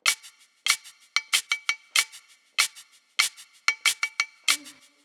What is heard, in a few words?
95 percussion loop pocket shaker